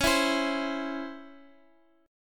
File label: C sharp Minor Major 7th; x,13,12,15